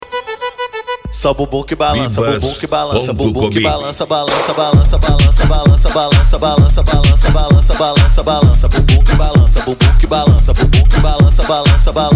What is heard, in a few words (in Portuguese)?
sound car carnaval